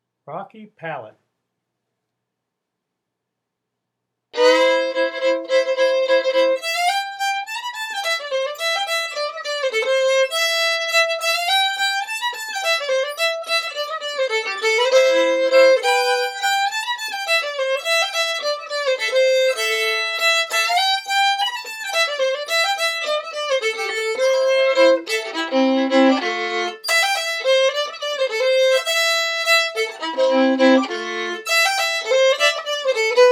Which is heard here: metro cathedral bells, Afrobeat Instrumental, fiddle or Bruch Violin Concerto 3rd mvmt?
fiddle